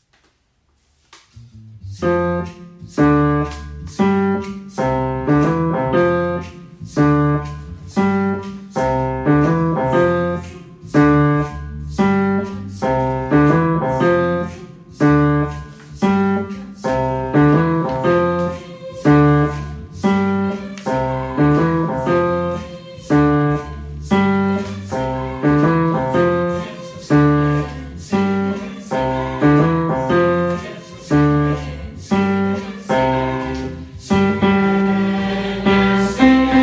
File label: BASS